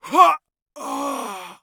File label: death; Escape from Tarkov